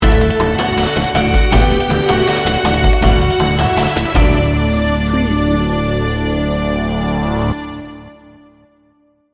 hope and inspiration sting bumper

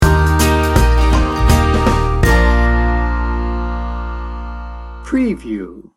americana folk pop upbeat sting bumper

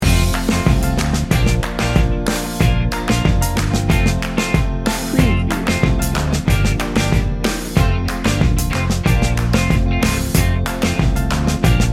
reggae pop star loop

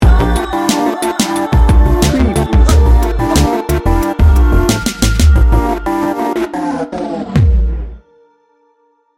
sultry sexy hip hop sting bumper

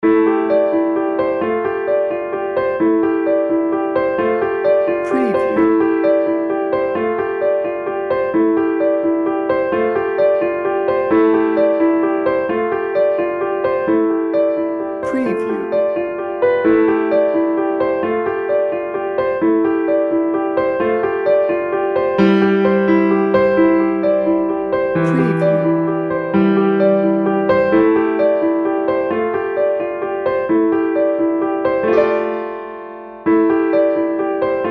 dreamy elegance no melody

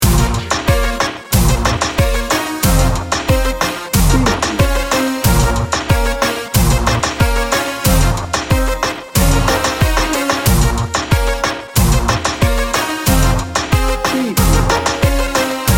reggaeton seduction

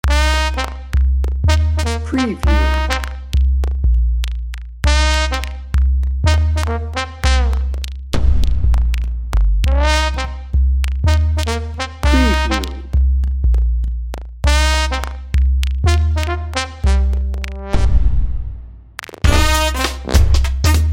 mardi gras funk no piano no sax solo

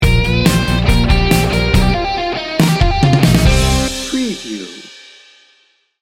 biker ride sting bumper

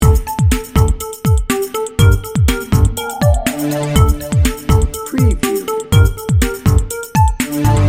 futuristic vision loop

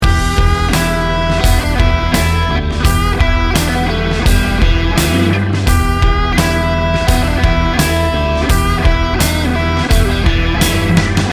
snake river loop

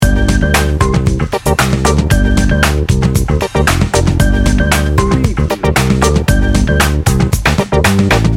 sleek and confident loop

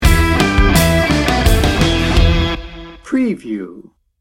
snake river sting bumper

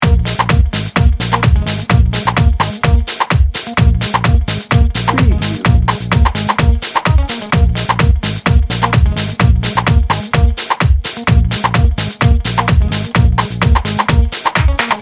funky fantastic loop

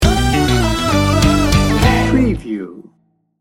sting bumper

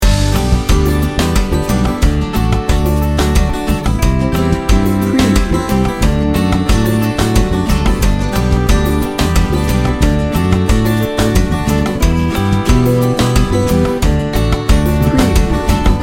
tropical cumbia party loop

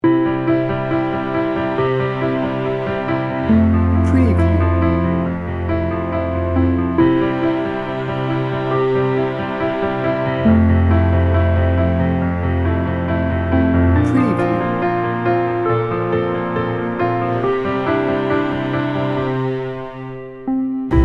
drifting no percussion

